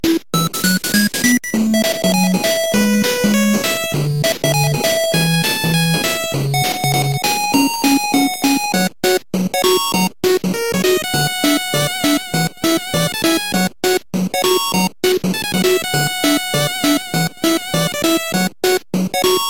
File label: 8 bit; Duck Tales